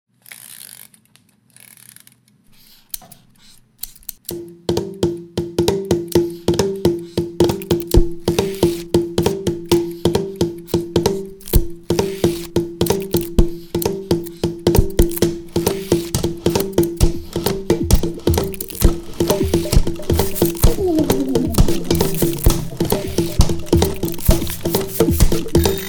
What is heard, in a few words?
jingle; jens